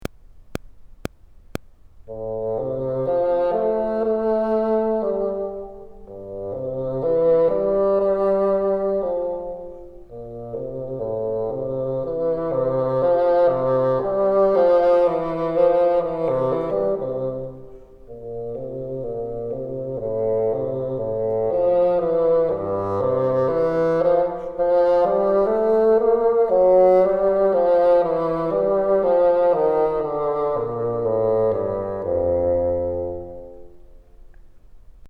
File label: bottom, bassoon